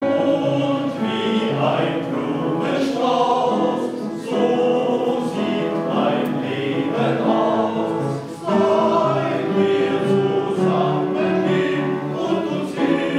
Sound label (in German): Schlager Quodlibet, gemischter chor